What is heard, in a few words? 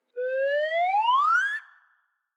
whistle UP